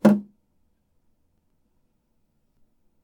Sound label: drops on sink, 台所ステンレス水滴; 台所ステンレス水滴２; wetarea